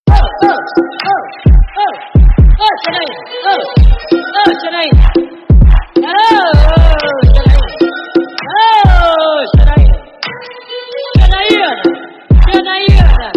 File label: trap remix